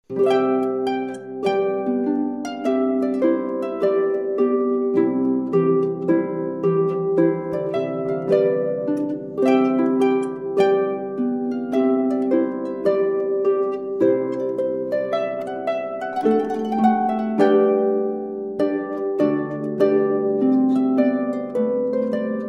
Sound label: serenata trumpet tune